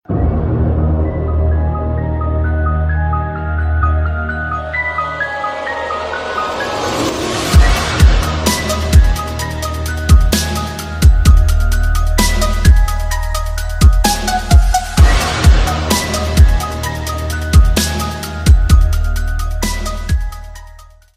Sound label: Ringtone